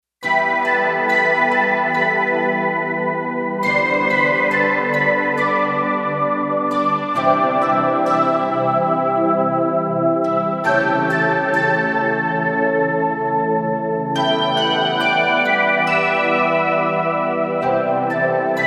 Harp Synth; Digital Synthesizer

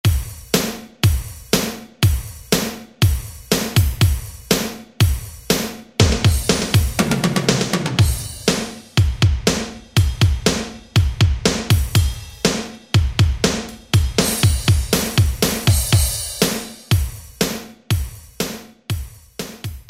Hard Rock, Alesis SR-16; Preset Patterns

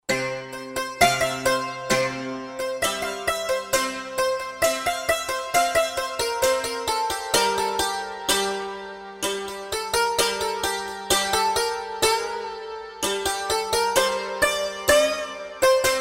St. Santur; World; Roland SR-JV80-14 World Collection Asia

